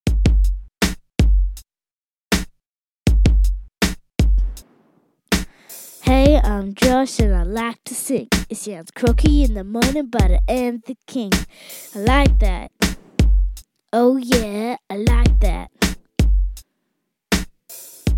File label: Rap Song; Music and More Club